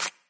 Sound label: card slide